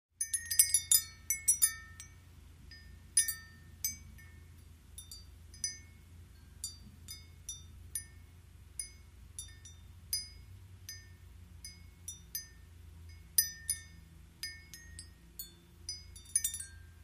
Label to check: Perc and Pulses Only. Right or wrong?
Wrong